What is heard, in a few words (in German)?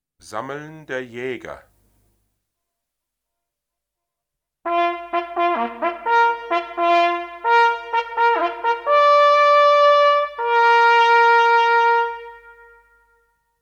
Sammeln Der Jäger, jachthoorn